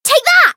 Voice attack

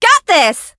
Voice attack

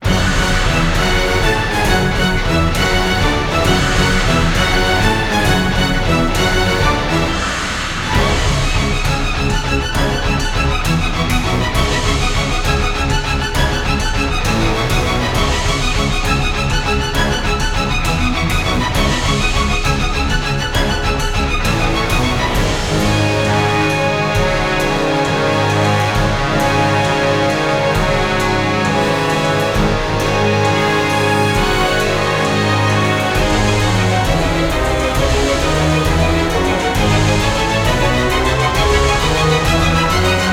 bgm battle steam sea

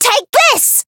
Voice attack

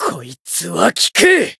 healed, jp

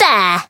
attack

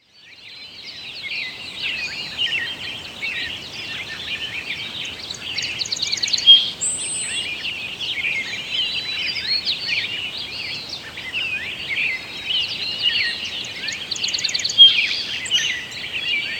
Bgm town coalmine

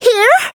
Voice attack; pom

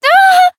damaged

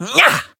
Voice attack; nero

